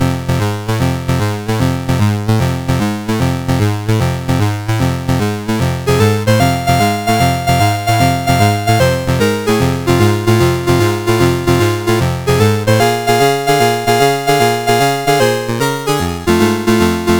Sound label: Boogie Woogie; JDs